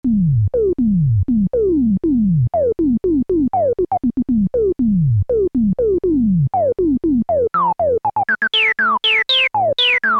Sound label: yamaha tg500 b filter lpf q100